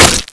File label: hammer hit body; weapons